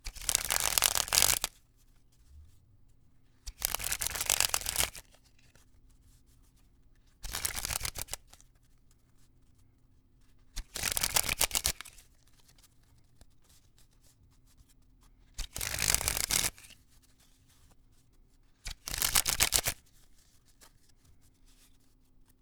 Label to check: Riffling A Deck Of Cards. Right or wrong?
Right